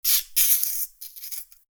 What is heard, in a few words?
hurt, aliens; bloodspider